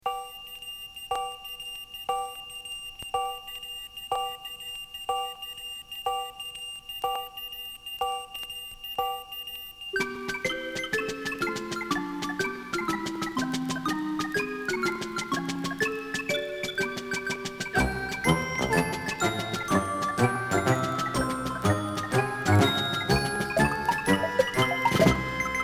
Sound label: Long Dink Dink Headlines Bridge